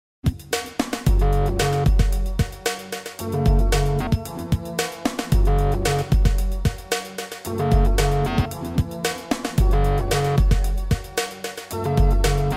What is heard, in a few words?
new age; podcast production toolkit